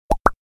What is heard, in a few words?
facebook like sound effect